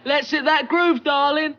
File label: Groove